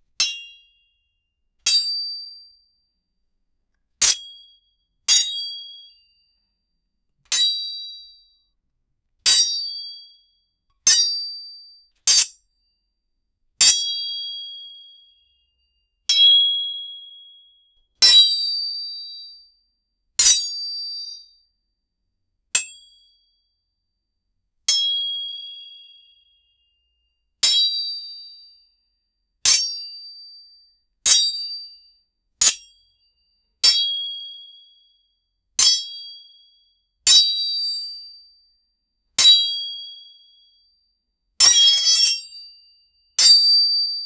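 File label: sword unsheathing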